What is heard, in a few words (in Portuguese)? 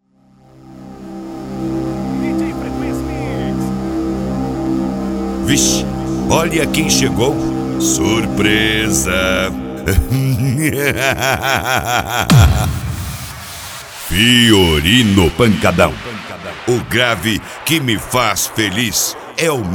sertanejo; fiorino pancadao